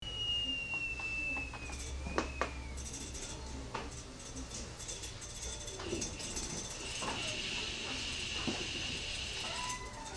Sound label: radiator whistlings saugerties ny